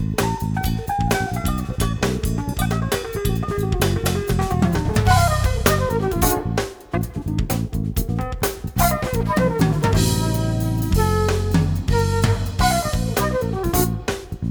Funk

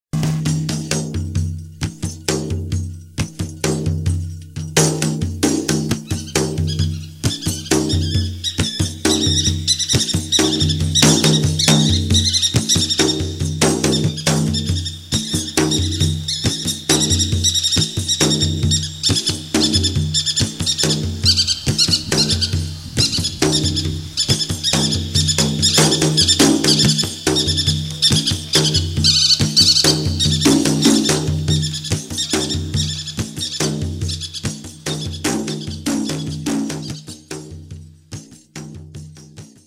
tam tam

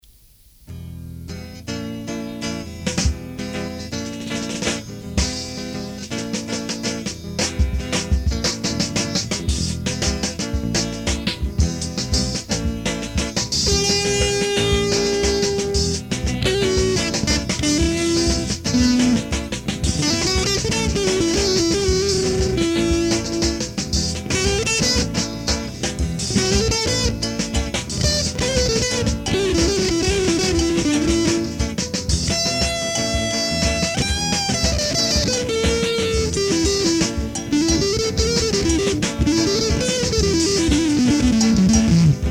instrumental

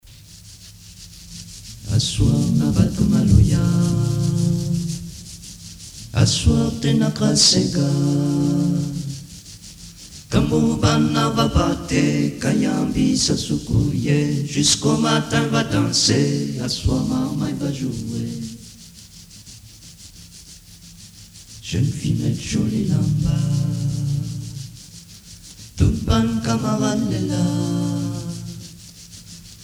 Maloya vocal

